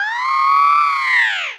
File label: cry